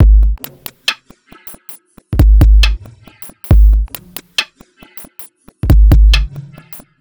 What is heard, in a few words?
Bpm137 Break, dubstep drum loops; Cholesterol